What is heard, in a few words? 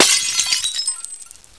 glass, debris